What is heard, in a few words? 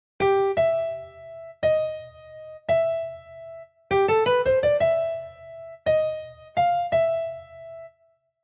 Underwater motif